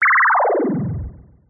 synth rocket clock robot wobble power down short; jessey drake

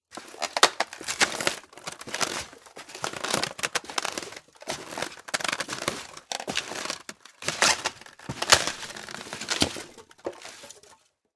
Footsteps Male - Boots Polystyrene

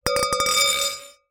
household glasses wine crystal x2 hit together; zapsplat

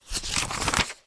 household telephone directory page turn

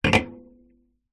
food drink can soda full down on wood surface; zapsplat

